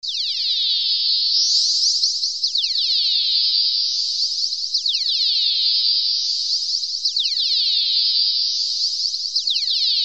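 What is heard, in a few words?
high frequent layer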